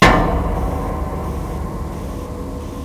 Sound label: Spooky Loud Noise; awe32